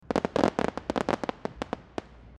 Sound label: Fireworks Crackling